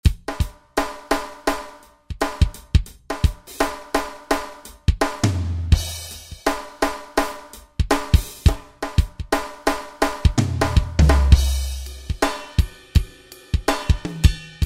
Funk SET, Roland SR-JV80-10 Bass & Drums

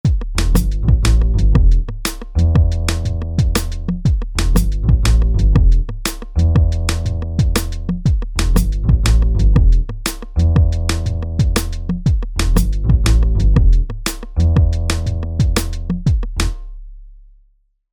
TripHop

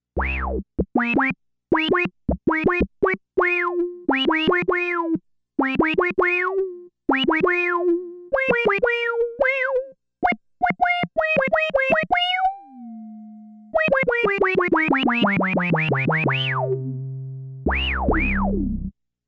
Funk Keys

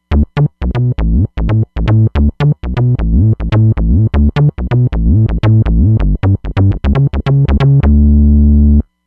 SH-1 Bass; Roland Fantom XR

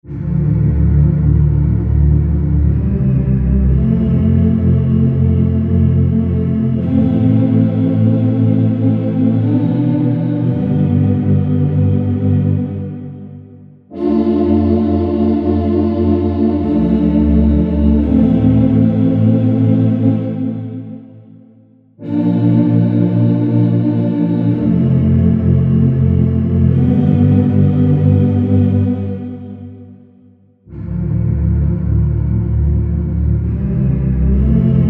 Oohs Souls, E-mu Proteus VX

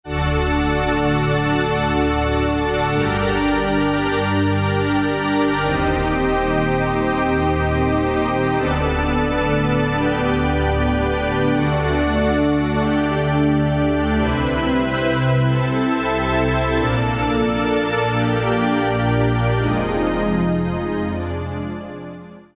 CLAVI; Best Service Alpha Dance II

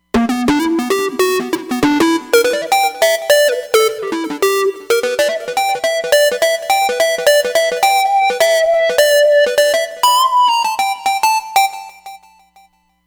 Xpress Lead, Roland Fantom XR

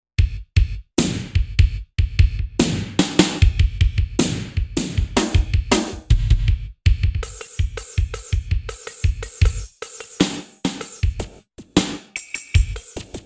Mega Drums

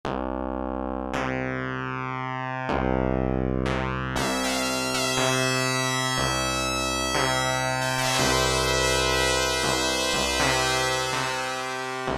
SITAR, Oberheim OB-8; Factory Patches

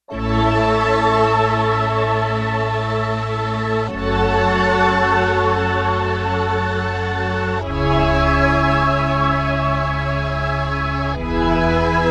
Future Chorus, synth

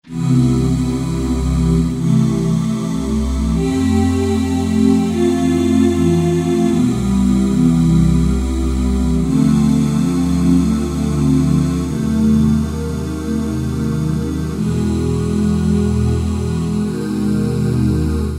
Lite Voices, Korg 05RW

